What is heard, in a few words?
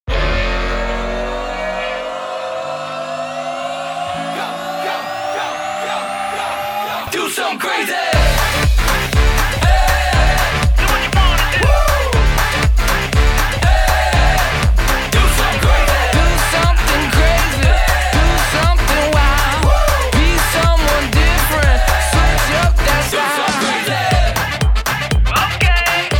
Mini Hip Hop